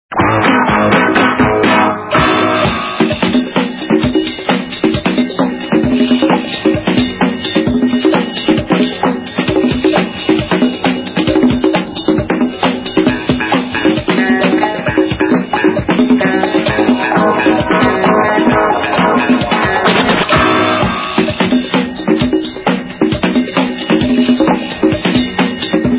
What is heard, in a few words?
bongos